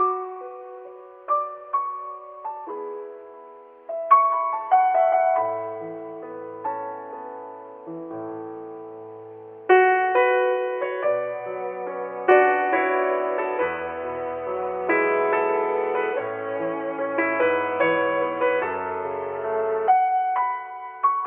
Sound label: piano, Loops, Sad